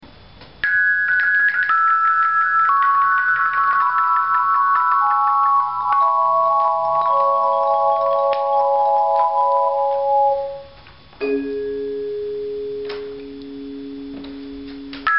Chimes

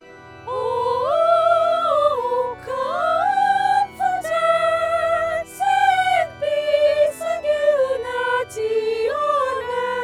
descant; Lord Keep Us Steadfast

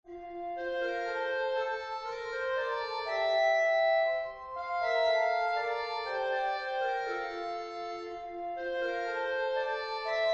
Clarinet playback; Christ The Lord Is Risen Today

